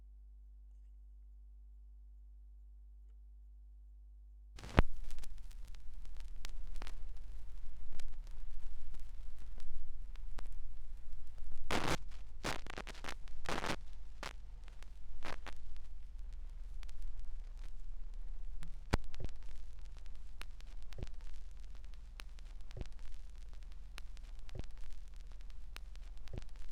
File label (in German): schallplattengeraeusche